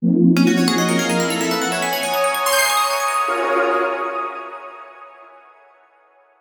Fusion Complete; Logiart Grimoire

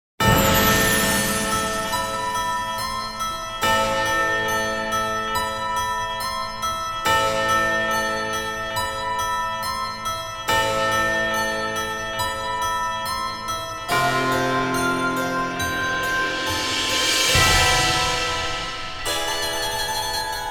game over; Bravely Default

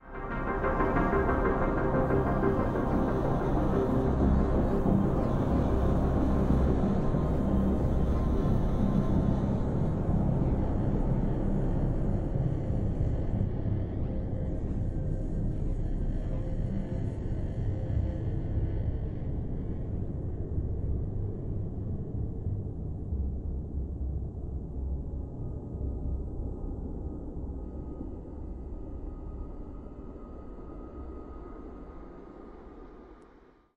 eerie sting; AirMech Command VR

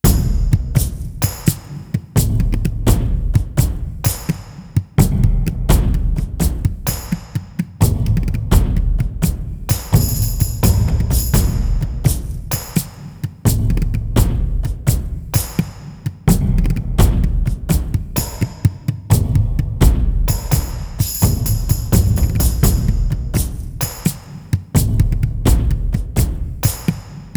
Perc Scotsmen

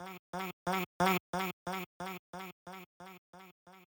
180bpm; 4beats; Goal Daddy Intro; Rhythm Sprout; deleted soundtrack

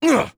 VO damage taken aerial; Aragami

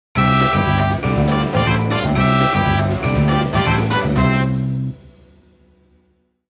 pachinko fanfare short

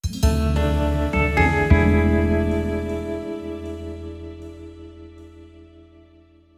Sting; CounterSpy; Android, iOS, PS Vita, PS3, PS4

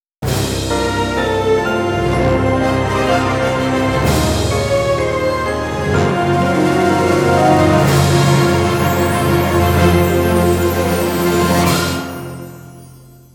Ultimate Jackpot Earned

